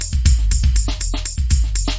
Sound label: 120 bpm general midi offbeat, drum loops and breaks